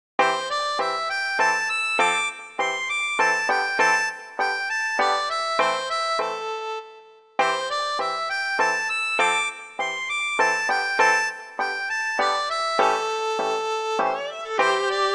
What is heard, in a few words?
RPG village; City